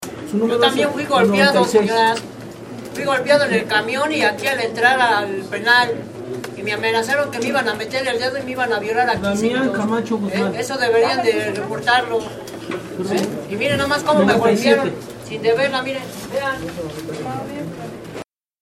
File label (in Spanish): hombre golpes; presas del penal de santiaguito